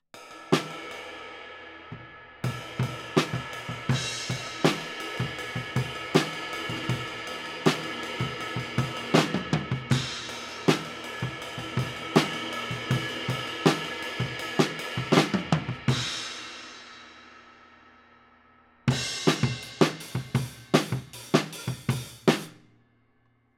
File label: Kit, 160 BPM